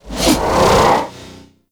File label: Spear Gun Throw Charge; Warframe; Tenno; Hoplite